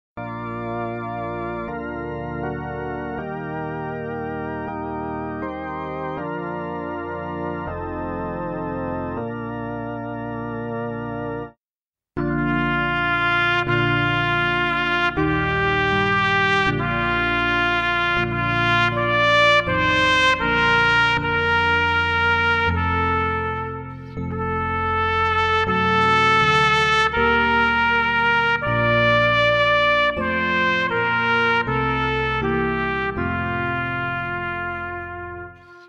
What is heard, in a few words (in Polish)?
sancta maria 1 trabka